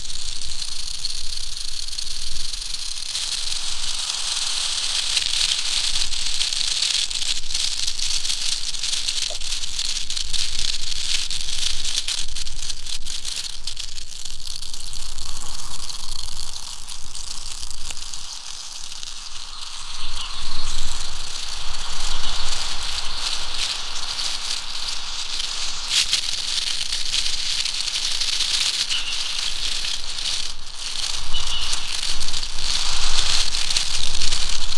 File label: extreme giant monster, gross